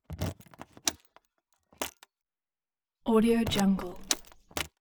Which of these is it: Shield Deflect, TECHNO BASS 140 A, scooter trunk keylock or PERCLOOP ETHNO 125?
scooter trunk keylock